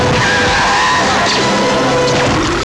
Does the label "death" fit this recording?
Yes